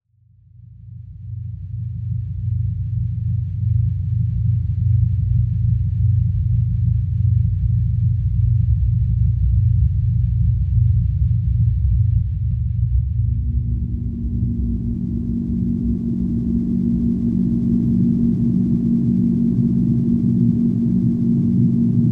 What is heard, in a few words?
Ambient Loop; Drox Operative 2